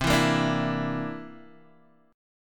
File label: C 9th, Guitar, Drop D